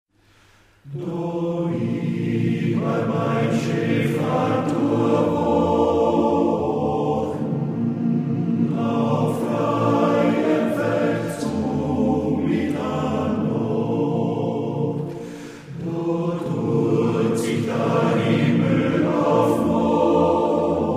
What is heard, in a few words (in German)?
polizeichor